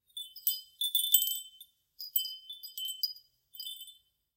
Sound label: Wind Chimes D